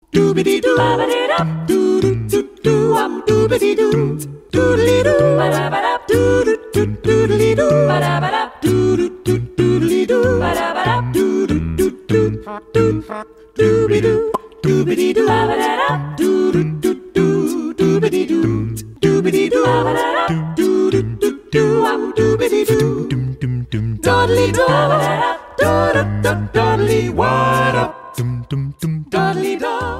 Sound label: Acapella; Coca-Cola